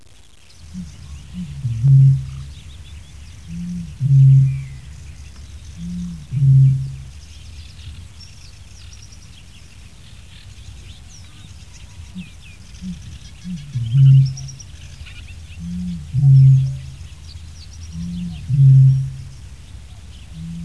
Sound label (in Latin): Botaurus stellaris